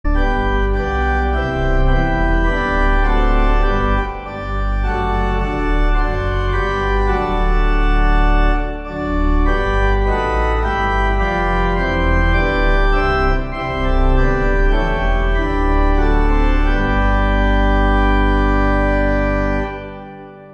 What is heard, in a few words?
Piano; Emmanuel